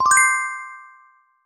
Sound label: upgrade; MiaoDaren